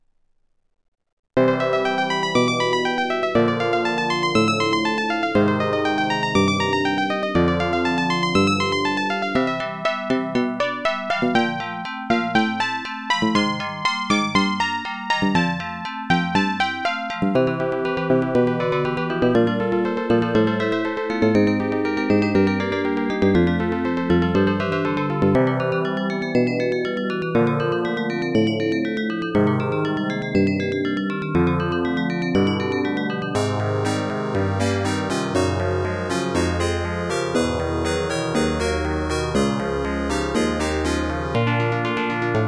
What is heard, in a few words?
ADLIB OPL2